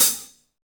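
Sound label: closed hi hat, Roland BK-5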